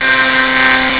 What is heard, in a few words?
ALERT